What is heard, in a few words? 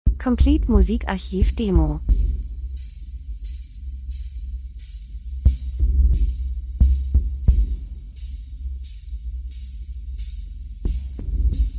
drums; Elektro Rhythmus Spannung Monoton Krimi Mord Verbrechen Nacht Kalt Aufmerksamkeit Spielfilm TV